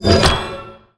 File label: UI Reward Rune; hero defense haunted island